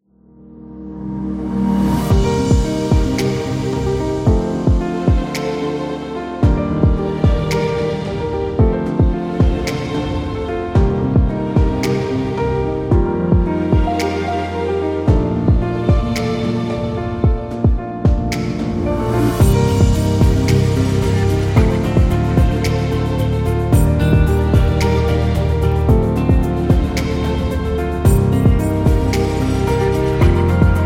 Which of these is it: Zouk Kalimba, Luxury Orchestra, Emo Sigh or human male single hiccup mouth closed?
Luxury Orchestra